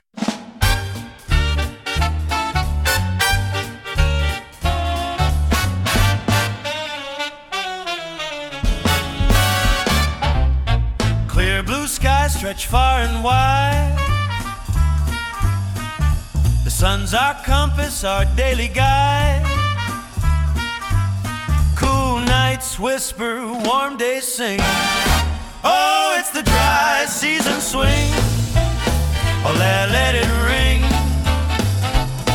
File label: Dry Season Swing